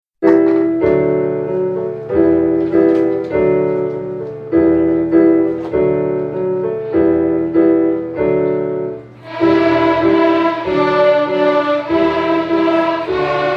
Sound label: lament for strings